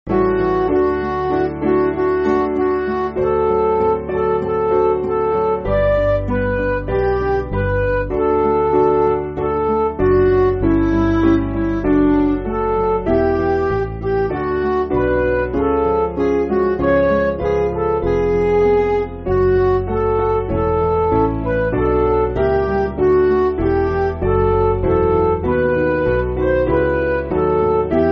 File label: Midi, Music Backing